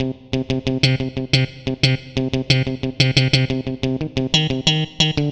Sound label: Funky Guitar, Guitar Synths